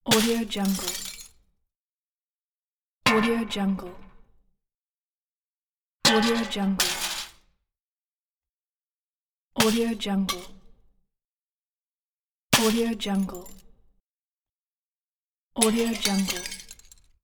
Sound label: glass large hit; shining-wolf